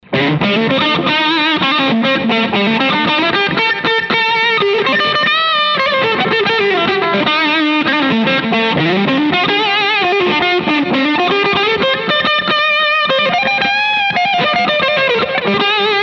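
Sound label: prs bridge dirt lead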